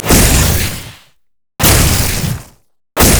incinerate; LoL; annie base

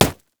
blue minion melee basic attack; LoL

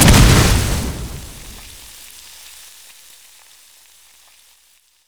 noxian thermal charge; LoL; urgot

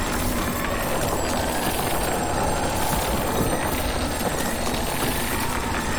pain loop; LoL; trundle

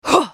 spellcast effort; Akali

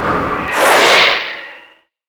teemo move quick; LoL; super teemo

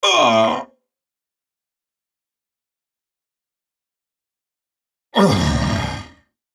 dying

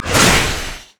blades attack; LoL; Nocturne; umbra blades attack

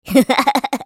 Sad Mummy laugh; Character Voices; English

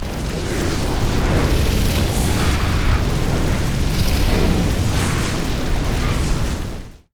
scorched earth; LoL; shyvana

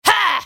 effort; Nidalee; Character Voices - English

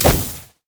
bandage toss; LoL; badrobotamumu

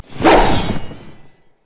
basic attack; Yasuo

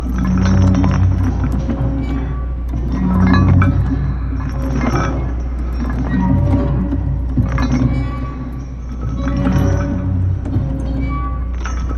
chains wind strong

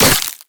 monkeyking basic attack; LoL

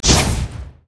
hypercharge; LoL; jayce

